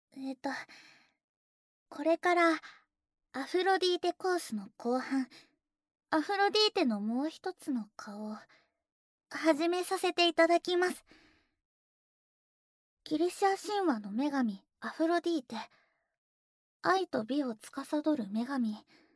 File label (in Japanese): くちゅ音無し 耳舐め＆手コキ, ASMR